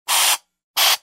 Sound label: spray